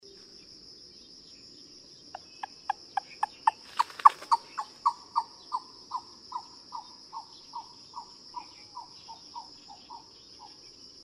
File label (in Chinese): 番鵑; 鴉鵑; 杜鵑科/夏候鳥